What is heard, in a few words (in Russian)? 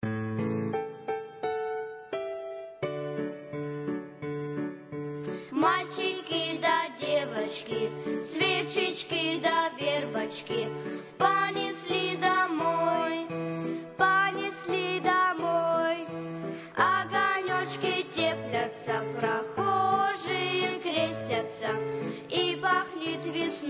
detskij hor; verbnoe voskresenie; pravoslavnoe verbnoe voskresene